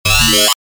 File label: energizing event